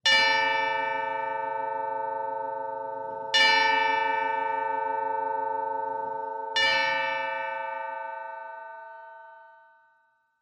til morgendorklokke, ringetone

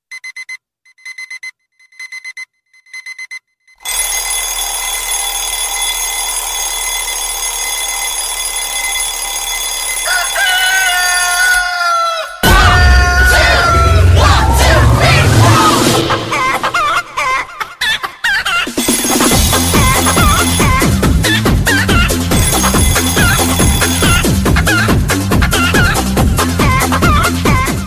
vaekkeur kyllinger

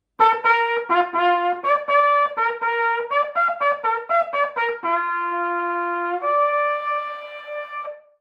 jagthorn

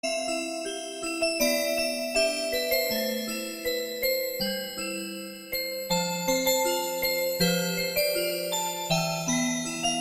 musik box tak

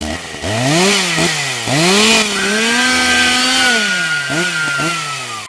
motorsav horror

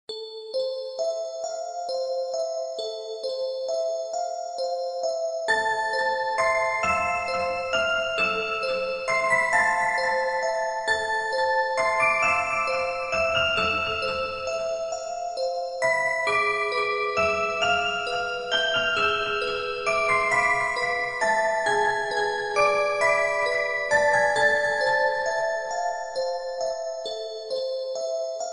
Uhyggelig Spilledåse ringetone

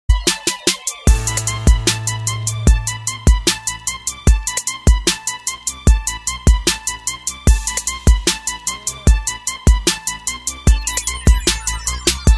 Samsung Galaxy S7 Officielle ringetone til mobiltelefon

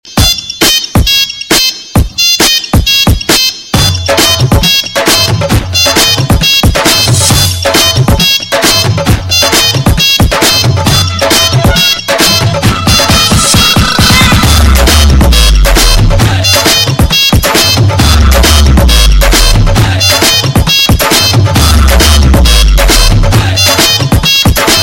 bedste nokia remix, ringetone

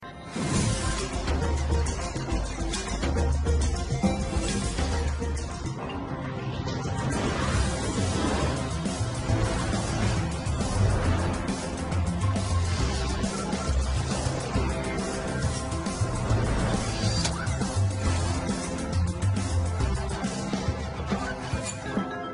meddelelser blackberry

